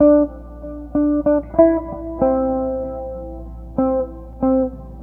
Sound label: Jazz Guitar; Sonic Foundry (Sony Creative Software); Stylus Pressure Urban Grooves on Digital Wax